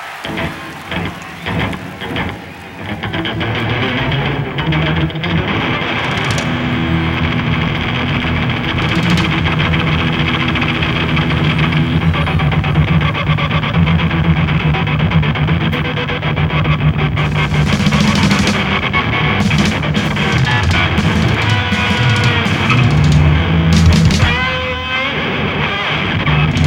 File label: guitar solo